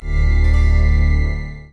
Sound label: creepy chord